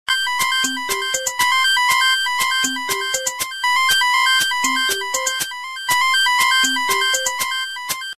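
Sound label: Huawei G2100, ringtone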